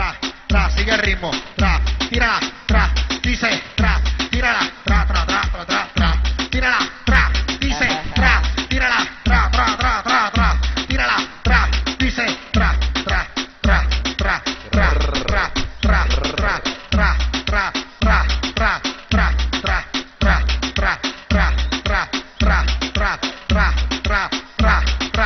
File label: remix hip hop